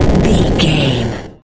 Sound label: retro explosion bass